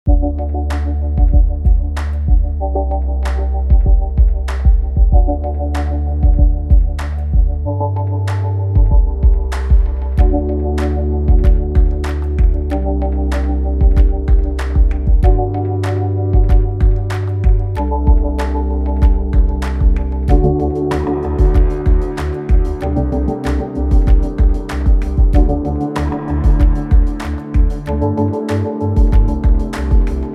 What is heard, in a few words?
downtempo